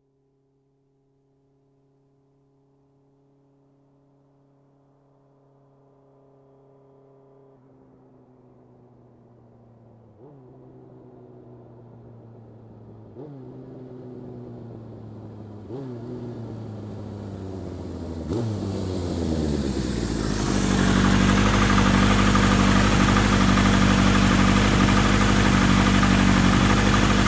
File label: Sportbike approaching and parking